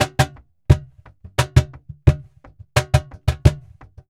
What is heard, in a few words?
flamenco percussion, tango; ProSamples vol.46 - Flamenco; Best Service